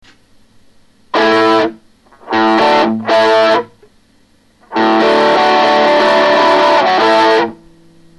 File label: overdrive; לעיתים אפשר ליצור את האפקט / לבד על ידי הגברת הווליום במגבר לא כלכך משובח